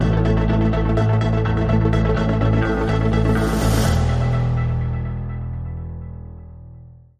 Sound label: Bumper